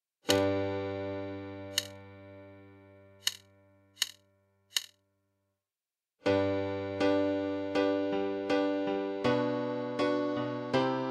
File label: taiko